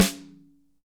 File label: snare dry; Real Mega Drums